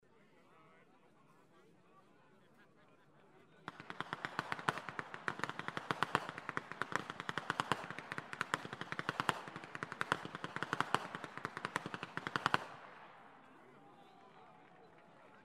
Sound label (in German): schnalzen, flachgau; ST. GEORGEN I